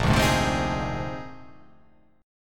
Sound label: A sharp 11th